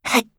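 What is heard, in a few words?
attack, Voice Line, Korean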